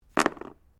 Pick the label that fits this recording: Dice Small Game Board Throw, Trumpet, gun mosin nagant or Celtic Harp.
Dice Small Game Board Throw